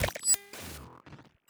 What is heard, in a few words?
glitch, Digital FX; TRUTH-HZ; Crowdsourced Sample Pack